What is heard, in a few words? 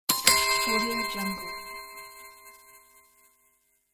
interface sound